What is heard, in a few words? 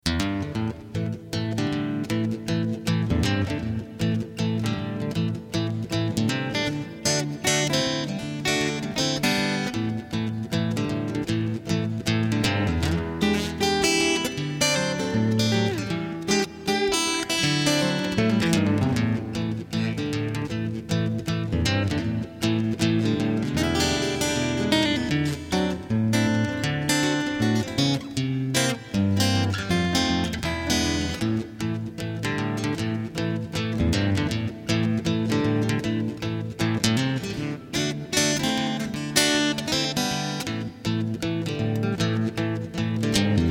Acoustic Blues